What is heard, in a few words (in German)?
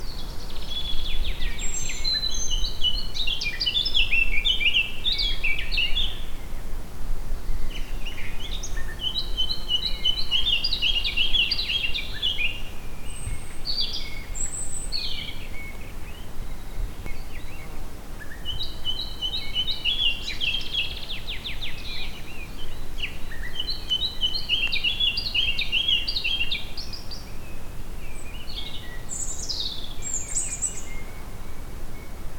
Moenchsgrasmuecke; Elmau GAP; Michle Peron